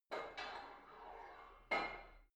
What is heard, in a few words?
barbell heavy plate put on soft reverby, fitnessstudio; sport freizeit